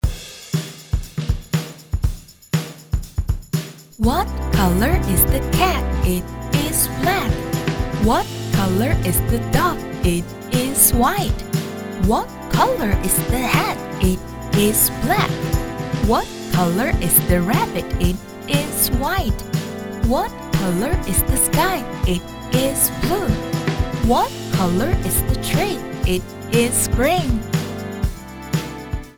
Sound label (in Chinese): 美式女英文游戏颜色歌